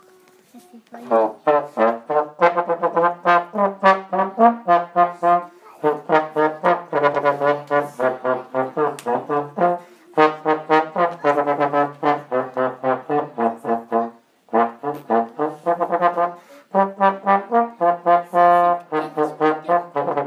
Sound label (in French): morceaux trombone a coulisse